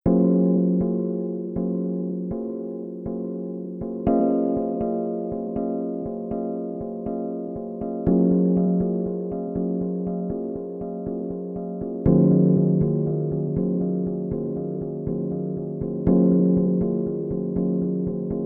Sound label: magic music ambient 432 hz; magic dream